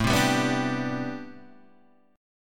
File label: A Minor 11th A down, Am11 chord; Am11 strummed; JGuitar